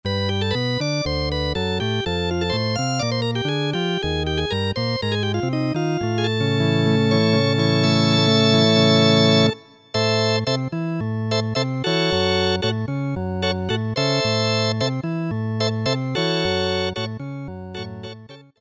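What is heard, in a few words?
Organ Vox Org, Yamaha EX5R; Factory presets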